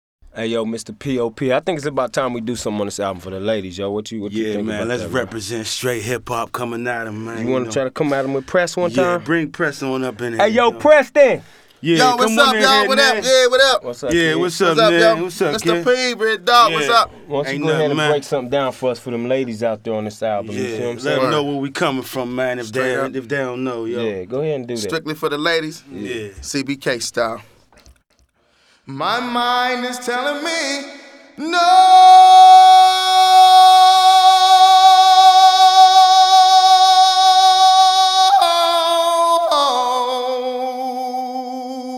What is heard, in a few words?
Acapella